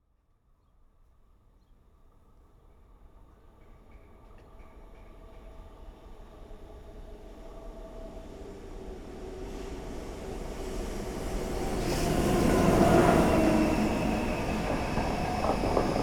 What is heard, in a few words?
Train long DPA